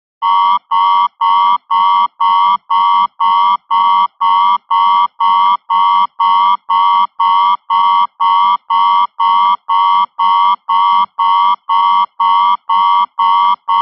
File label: Beeps Alarm Electron